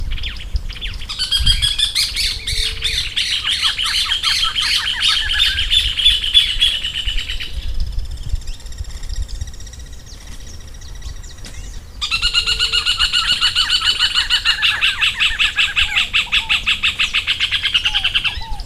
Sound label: rufous hornero